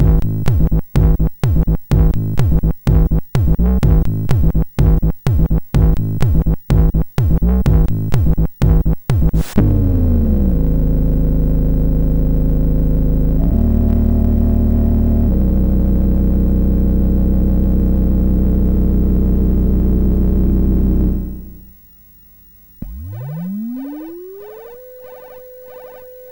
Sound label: sid MOS6581; hvsc